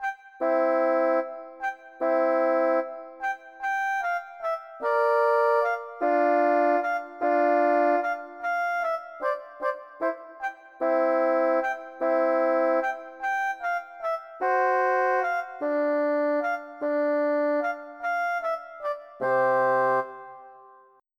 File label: BAROQUE